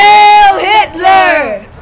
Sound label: hail hit